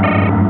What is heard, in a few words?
atmosphere